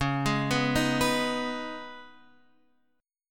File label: Db 7th Suspended 2nd, Guitar, Half Step Down